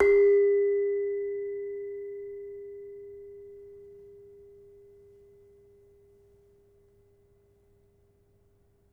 Demung soft, gamelan; gravityharps; timbrebox

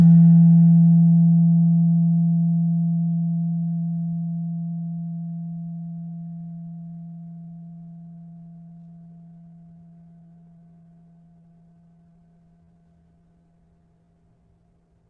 Slentem 3 F, gravity harps tuned

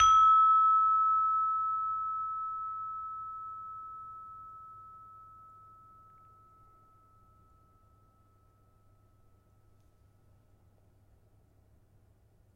Peking, gamelan

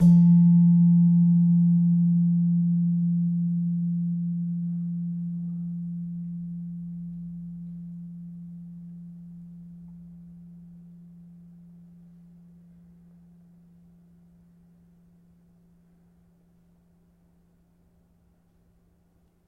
Slentem, gamelan; gravityharps; timbrebox

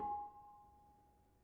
Bonang Barung soft, gamelan

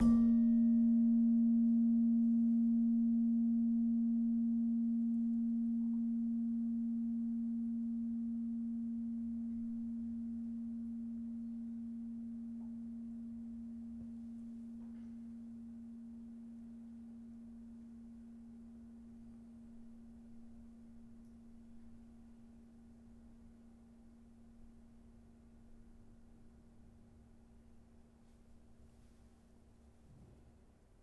Slentem, gamelan; gravityharps; timbrebox

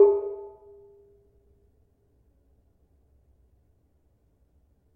Barung 4 G sharp, gravity harps tuned

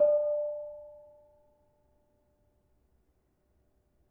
Bonang Barung, gamelan; gravityharps; timbrebox

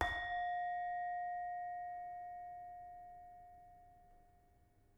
Saron soft, gamelan

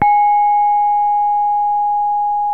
gangsa mid, gamelan; gravityharps

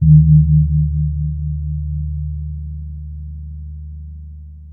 gong, gamelan; gravityharps

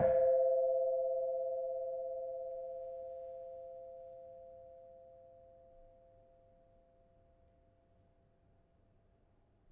Kenong soft, gamelan; gravityharps; timbrebox

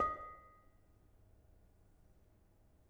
Bonang Panerus soft, gamelan; gravityharps; timbrebox

